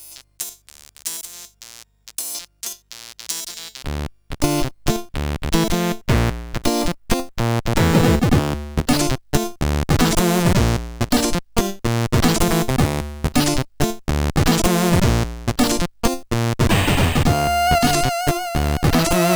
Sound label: Funk sid CSG8580R5; Mother Funk Signal